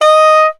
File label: Soprano Sax Portato D#4; Orchestral; DirectWave; Varazdin Orchestral